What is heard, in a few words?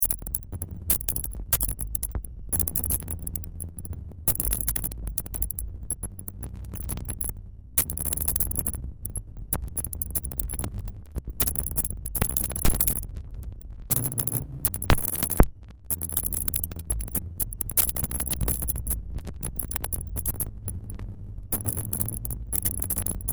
Digital error sounds; Digital Scramble; Glitches (Sound Effects:Sound Design)